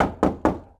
door knock friendly; Rust

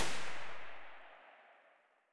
aksu close loop silenced tail; Escape from Tarkov

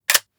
mp5 bolt shut; Rust

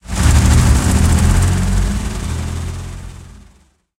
m4a3 engine load, engines tanks; War Thunder

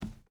footstep burlap wood run; Rust

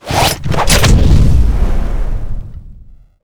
throw whip up

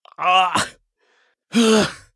agony; Escape from Tarkov

